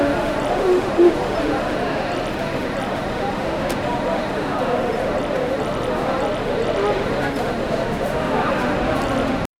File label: ambient crowd walla in a; bustling